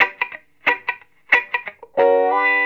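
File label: reggae electric guitar B; Best Service Advanced Media Trax Modern Composer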